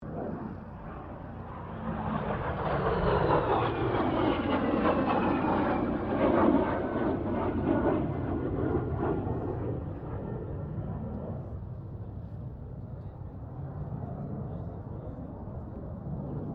AIRCRAFT DH-115 VAMPIRE T.35 PASS STEREO, Vampire Fighter Jet; 1946; Airplanes; Vehicles